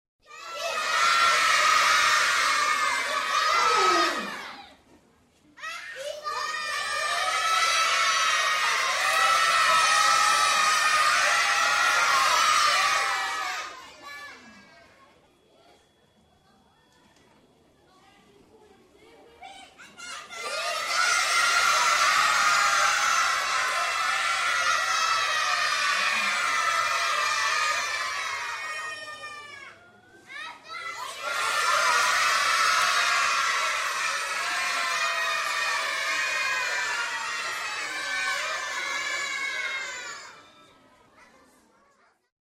Anthropoi Andidraseis Apo Polla Pai

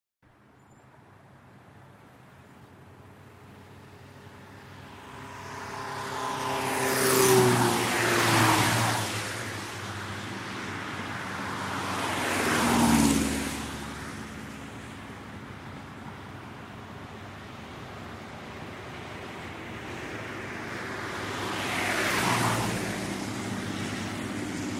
Autokinhtodromos

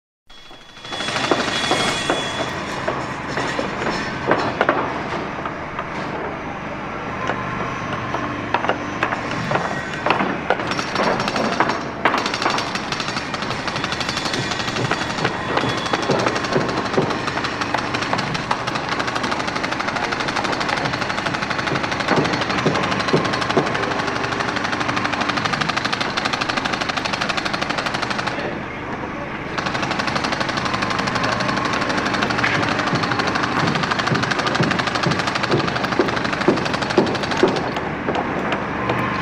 Ergates Se Oikodomh; Epaggelmata

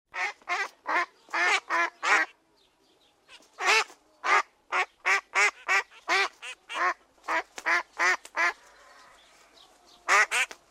Zwa Papia

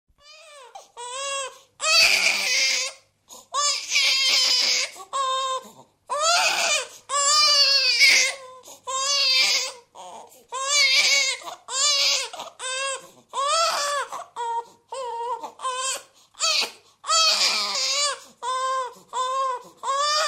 Anthropoi Klama Mwroy

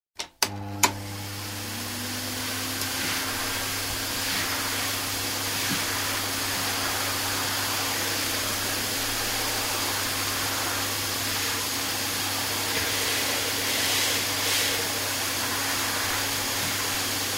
Skoupisma Me Hlektrikh Sk

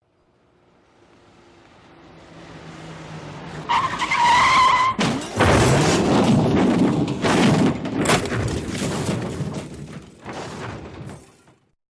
Mesa sygoinwnias Trakarisma

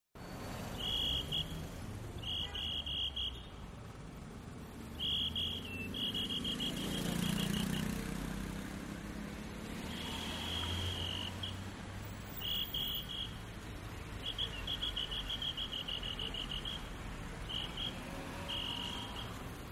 Epaggelmata Troxonomos

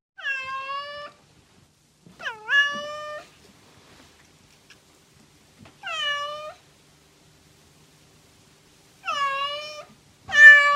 Gata

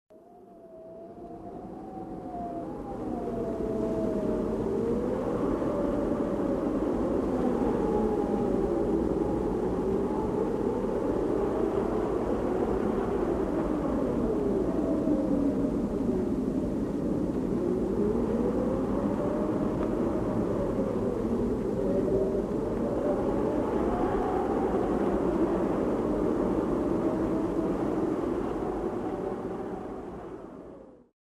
Perivallon Aeras

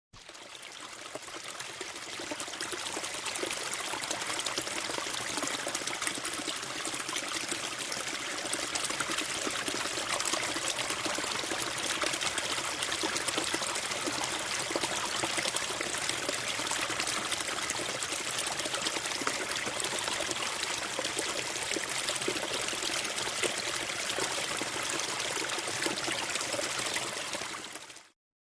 Perivallon Nero Poy Trexei

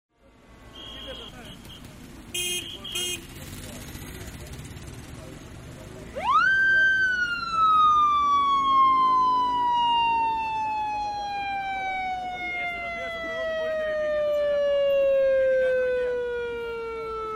Pyrosvestiko

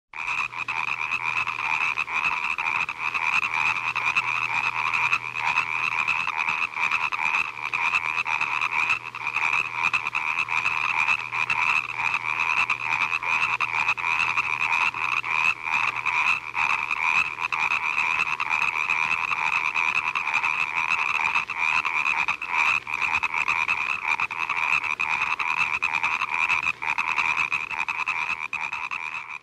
Zwa Batraxoi

